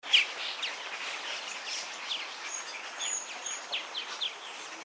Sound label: MARTIN PURPLE